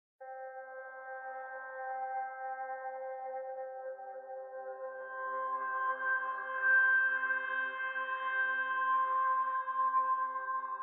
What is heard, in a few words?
Loading